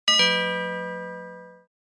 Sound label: join meeting